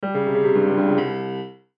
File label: Piano Descend, Piano Descend Dissonant Fast; Cartoon; Musical